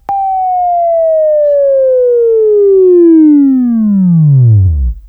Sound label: WAV Right, Sweeping; Sweeping Training program